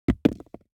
DICE on Hard Wood, Throw and Roll, Foam, Two Dice; Samples; ArticulatedSounds; DICE Sfx Party (Extended)

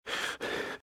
Breathe - Fast Heavy; RadwinIsland

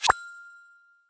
BEEP; skype

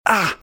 hurt; RadwinIsland

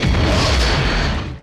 Torpedo Shot, Weapons

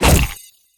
Abomination Melee Magical; Kilta

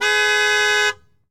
Mustang Horn; Automobiles

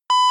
clocktick; Code7

